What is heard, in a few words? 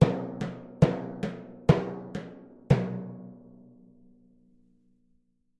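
Timpani Hands Slow, mallets timpani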